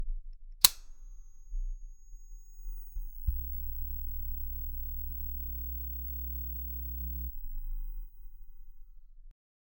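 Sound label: electricity lamp is turning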